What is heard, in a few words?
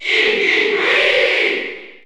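Cheer French, crowd; SSBU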